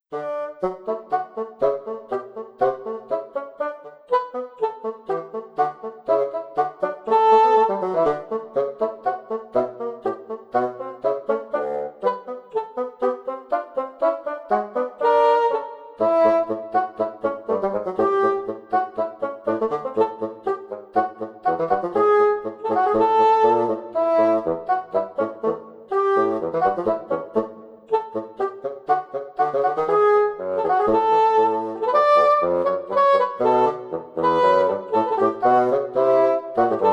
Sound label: Duo Tedesco – German Duet